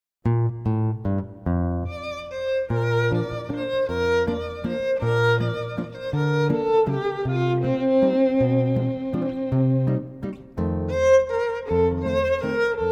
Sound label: Duo of Guitar & Violin